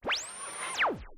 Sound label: teleport